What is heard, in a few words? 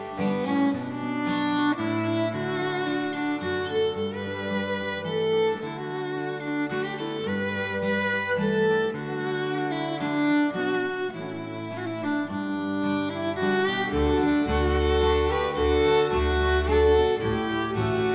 Thanksgiving Waltz; Harvest Home